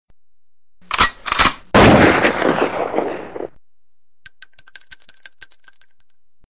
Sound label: Shot SMS